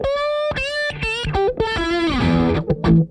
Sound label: DRIVER LICK, Funk Guitar; 90 s Sample CDs; Zero G